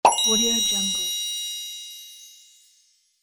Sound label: sparkling pop; apple hill studios